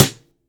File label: normal hit normal; Azer+ 2023; ryuuseigun